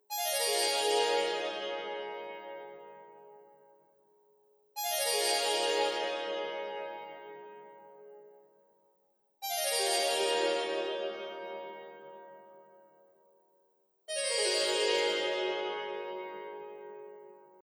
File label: sitar heaven; real sitar cascade sample; amore&guerra(2006); The "Paradise" effect to the real sitar cascade sample was realized in RoomVerb